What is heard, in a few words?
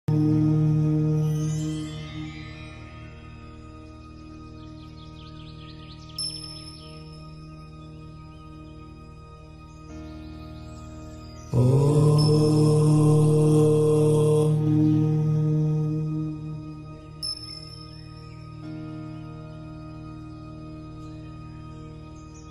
om ringtone relaxing music yoga